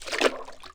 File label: grenade water